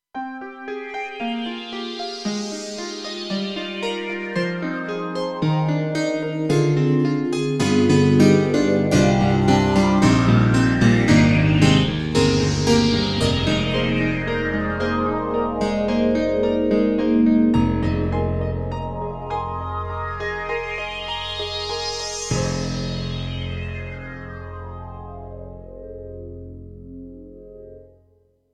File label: dance floor grand, TRITON Rack; KORG EXB-PCM08